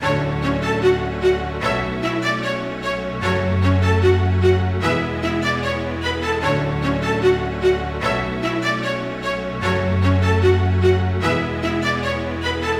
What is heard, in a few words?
Future Bass String Loop, 150 BPM F# Min; Instruments